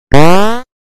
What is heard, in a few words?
event success